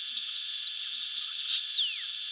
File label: Radio Loop; Playable Tutorial Level; TR3